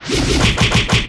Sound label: napalm vulcan strike; hyper novice